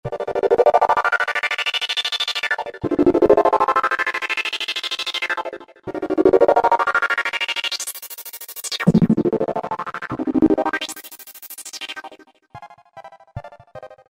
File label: Gated Chord, Korg microKORG; Factory Voices